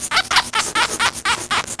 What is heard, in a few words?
crying, sugar glider